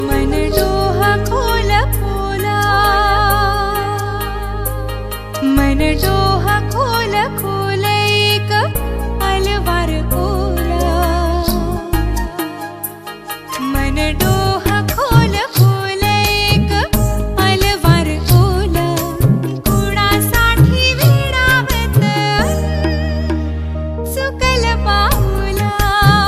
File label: Ringtone Female